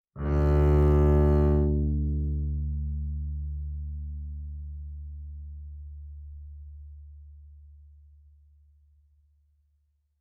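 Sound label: Bass arco fortissimo sul D D2, Double Bass; MIS Pitches - 2014; Strings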